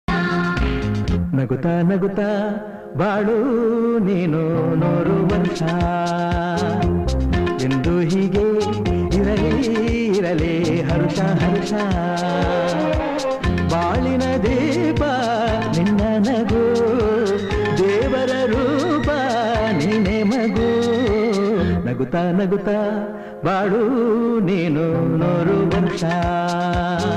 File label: kannada song